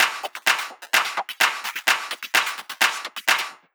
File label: Drum Loop 128BPM Clap FX; VEC5 Drumloops; VEC5 Multi Loops; Loop Elements